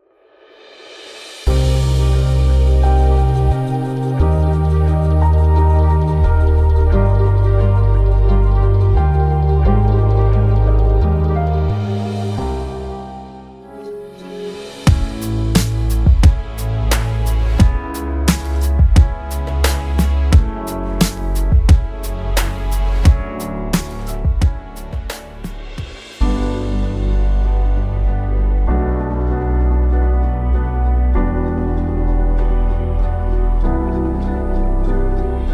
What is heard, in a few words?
chill trip hop examples